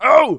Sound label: pain, male; npc